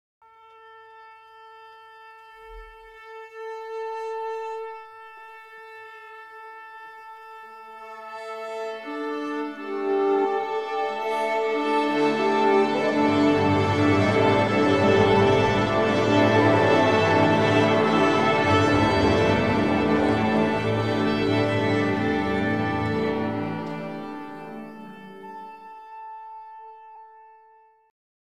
Orchestre qui saccorde

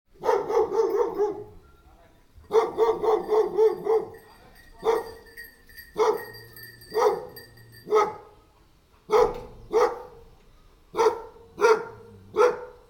Chiens aboiements portail

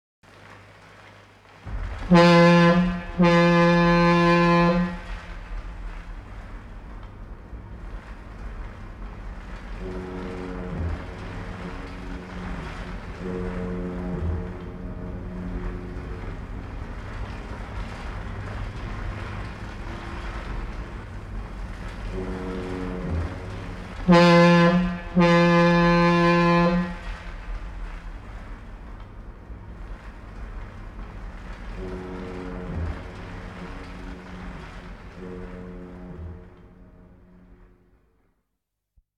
Bateaux Bateau entrant dans le port

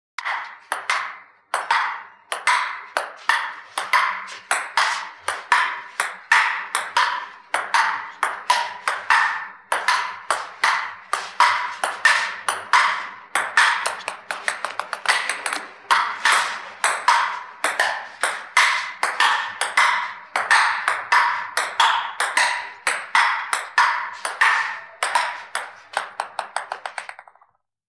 Jeux Ping pong

